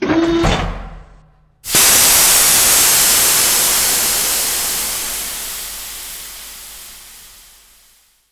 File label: Airlock Extended